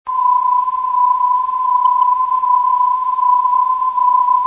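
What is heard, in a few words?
non-directional beacon; 434khz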